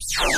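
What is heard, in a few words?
electro quick sweep, sci-fi space fx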